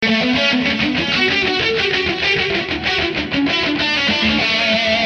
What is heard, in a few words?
lead